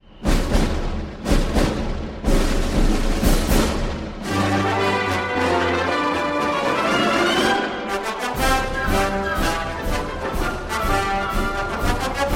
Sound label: Marching Music Only